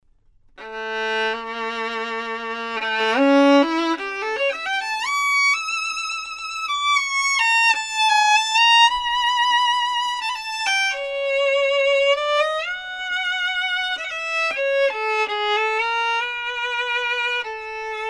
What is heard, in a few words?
violin, professional